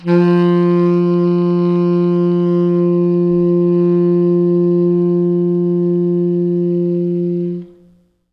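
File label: T Sax, tenor sax; B. Webster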